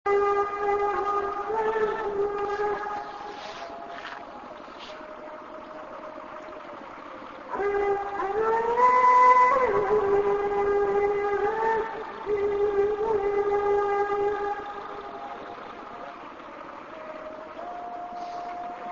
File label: azan, leh